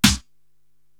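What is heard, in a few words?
snare drum Jungle, Drum Machines, Korg TR-Rack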